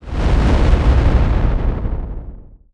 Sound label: transition; Bluezone; Nightfall; Scary Sound Effects And Ambiences